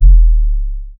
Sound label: sub hit